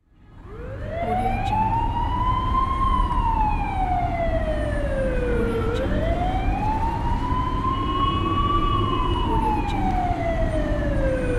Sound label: ambulance in the city; erhanaarassfx